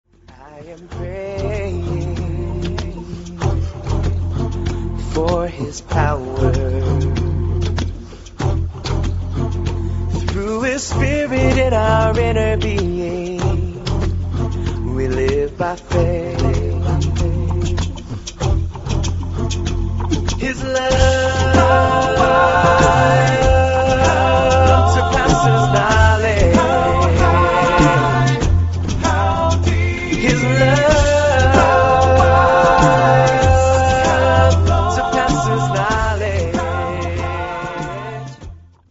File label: acappella